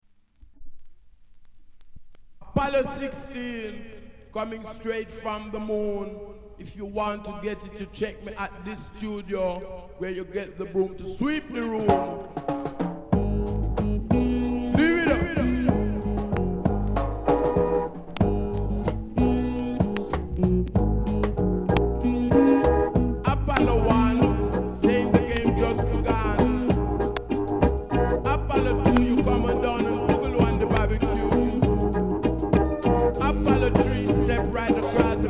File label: jazz